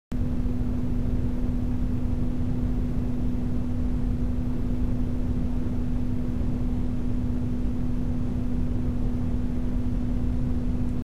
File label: Corsair AF120 Performance Edition 12V